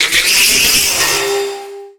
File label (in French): Cri